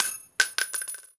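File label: shell bounce small, weapons, shells; ModernWarfare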